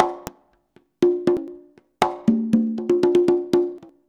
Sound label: CONGA 119, Modern Percussion Loops